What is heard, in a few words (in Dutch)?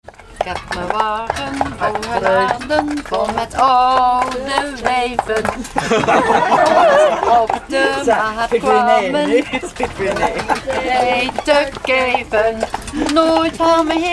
zang